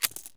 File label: ammo generic use; Escape from Tarkov; ambush